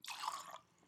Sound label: Pour Water